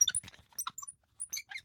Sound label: Wheelchair push; Phasmophobia